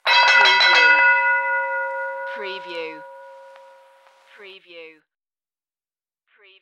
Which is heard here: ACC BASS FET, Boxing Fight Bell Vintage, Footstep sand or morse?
Boxing Fight Bell Vintage